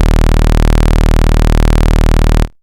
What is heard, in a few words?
Bass Cuts; Instruments; VES1 Bass Cuts